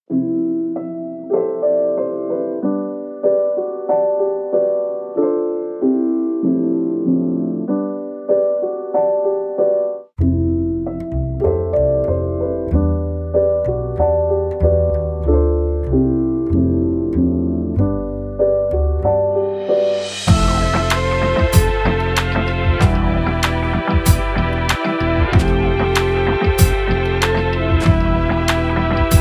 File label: Charming